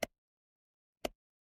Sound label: instrument wood block